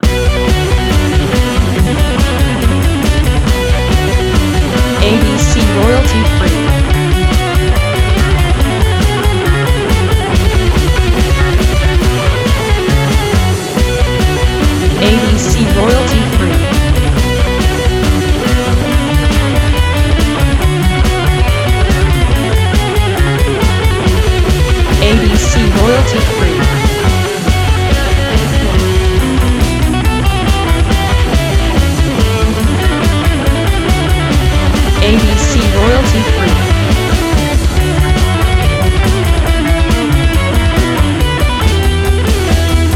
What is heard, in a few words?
badinerie